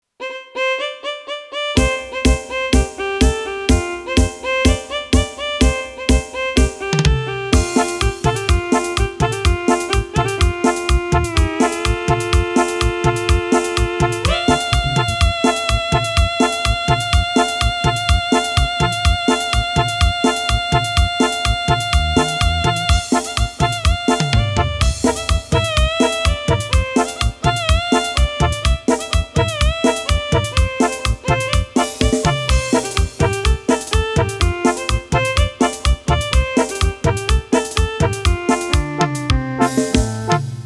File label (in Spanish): Cumbia